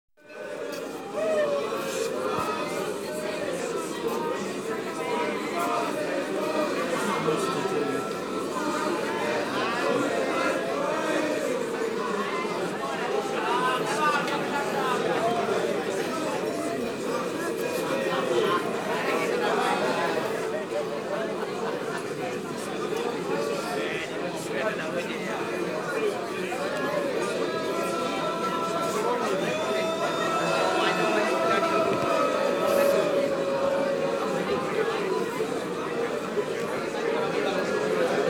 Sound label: Public House, Very Busy; BBC Sound Effects Library